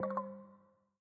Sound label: quick Stop; netent; luckyangler